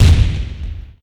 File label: boom, skyrocket; xbmc4xbox; screensavers; rsxs-0.9